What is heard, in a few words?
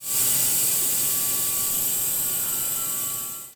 steam pressure